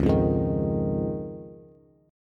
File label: C sharp Major Add 9th, Bass; C#add9 strummed; JGuitar